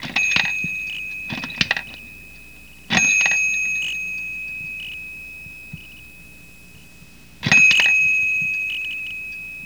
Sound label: baby bell